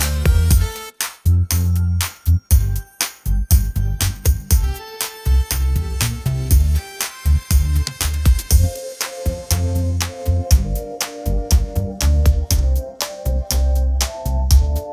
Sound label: bright pop love anthem